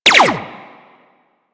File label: Burst Laser Pistol Fire; DE; Warframe; Weapons; Sentinel